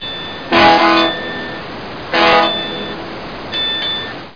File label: ship